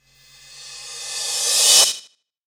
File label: Crash rev; Percussion Sample; Electric Jazz; 130 BPM; loop; перкуссия; Crash Reverse A (Электро Джаз)